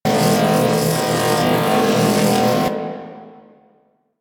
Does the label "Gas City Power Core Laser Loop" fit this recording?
Yes